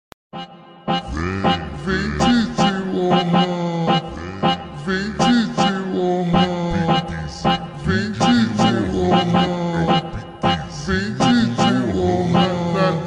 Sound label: ultra slowed high bass boosted